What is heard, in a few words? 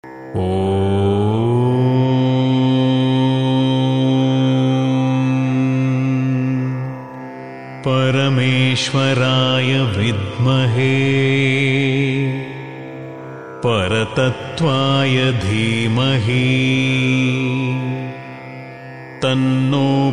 parabrahma gayatri